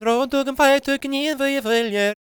GAELIC, World Voices; ILIO; Vocal Planet

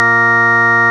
CLICKY A#2, clicky organ

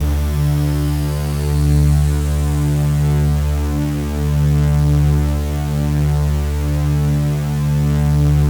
SIZZLE D3, SIZZLEPAD; Drums In Your FACE!

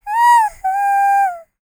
LICKS, Vocal Planet Jazz FX; Spectrasonics

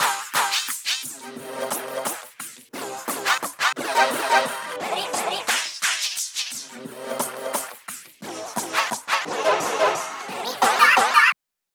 dub fx; EZRollers

